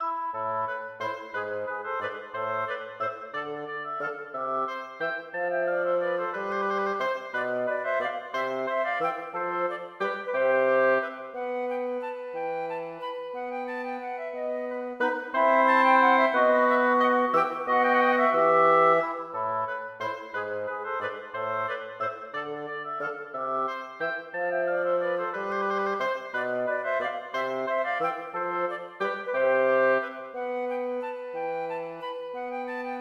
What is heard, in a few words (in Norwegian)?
Gigue for blåsekvartett uten cembalo; Hertz